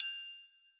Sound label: triangle; fl studio; xander brown drumkit